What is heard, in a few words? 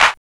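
clap; E-Lab; X Static Goldmine 1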